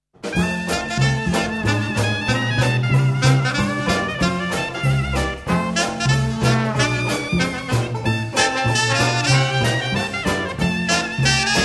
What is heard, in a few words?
Blue grass, Jazz Band; Retro